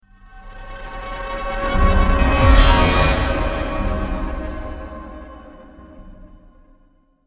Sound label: Stinger Swell 5 Seconds